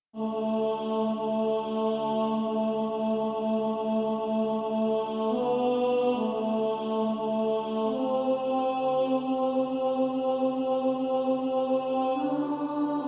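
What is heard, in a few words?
tenor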